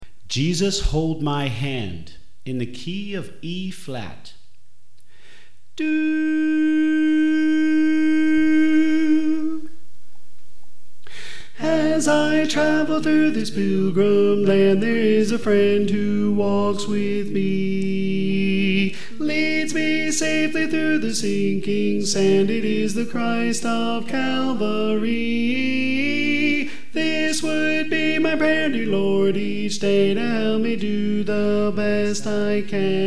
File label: Tenor